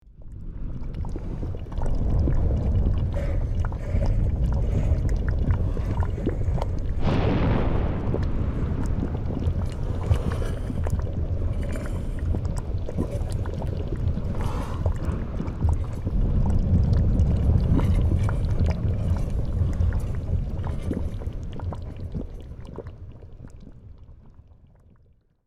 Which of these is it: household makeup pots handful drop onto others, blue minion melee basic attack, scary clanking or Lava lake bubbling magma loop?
Lava lake bubbling magma loop